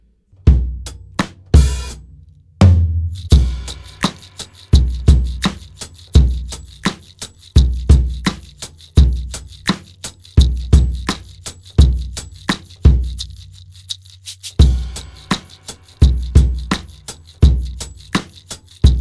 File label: 85 bpm